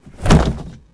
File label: camera away; scuttlebutt